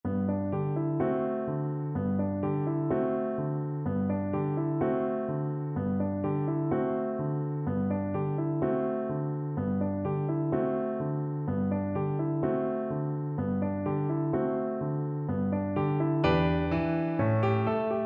berceuse BN, Bassoon

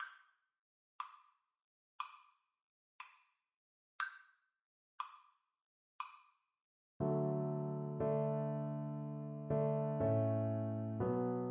Cello

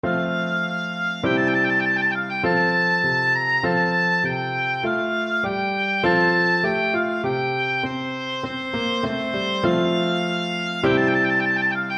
trumpet voluntary, oboe

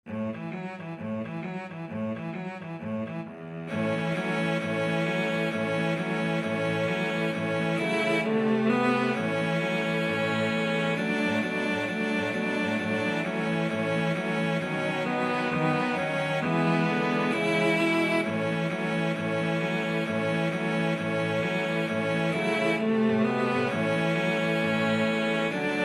cello quartet

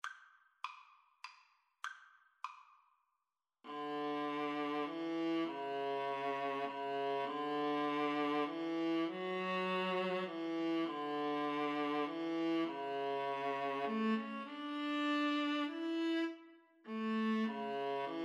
jupiter, violin viola duet; holst

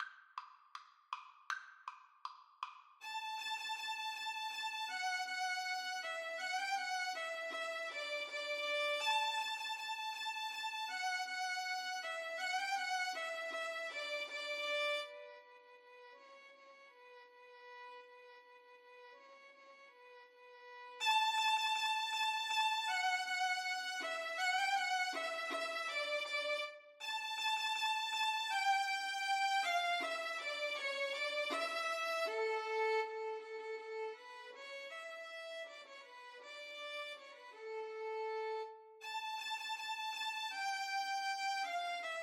marcia violin duet

